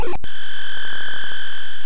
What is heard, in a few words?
magic; scummvm; ultima4